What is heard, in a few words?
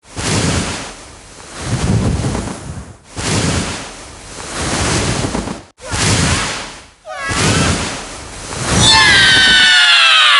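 Boss Appearance Bird; Super Monkey Ball Banana Blitz HD; Boss Toujou Tori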